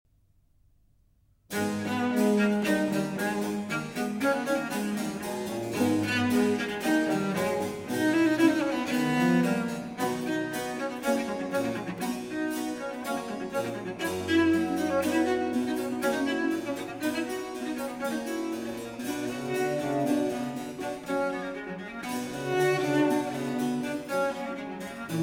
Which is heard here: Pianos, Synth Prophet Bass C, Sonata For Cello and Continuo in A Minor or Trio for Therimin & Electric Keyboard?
Sonata For Cello and Continuo in A Minor